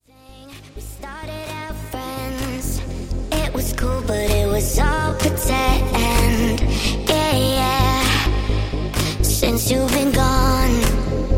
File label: Club Mix Intro